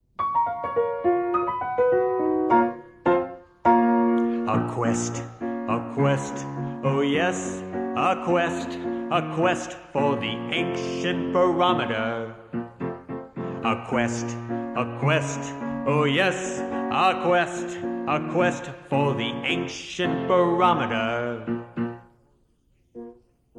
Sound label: vocals